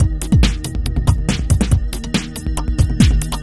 Normal Beat 140

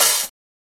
HiHat

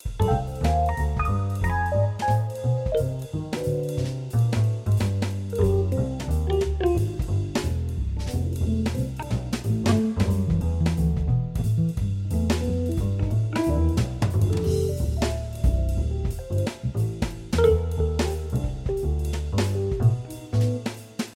Jazz Instrumental

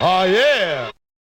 Hip Hop Vocal

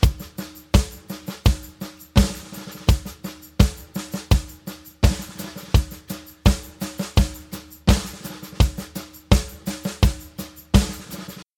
Live Drum n Rolls

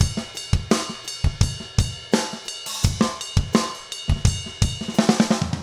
Metal Drum Loop

